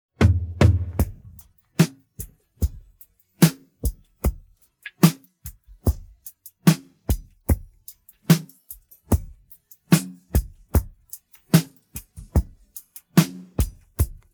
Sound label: pattern, drums